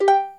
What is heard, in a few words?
Completion Sound; QPict Media Organizer